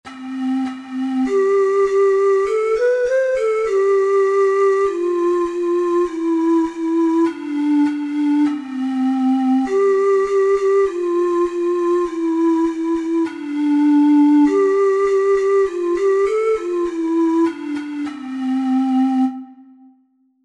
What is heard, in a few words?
baa baa black sheep, ocarina four hole